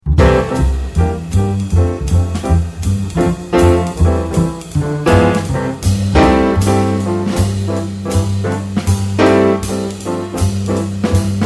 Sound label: rhythm section, jazz